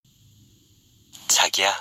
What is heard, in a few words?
Text tone